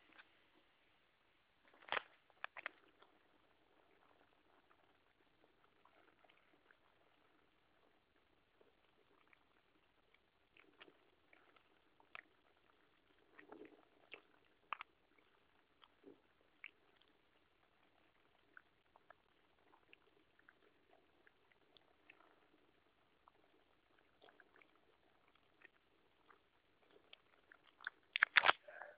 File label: Fermenting Nebbiolo Sounds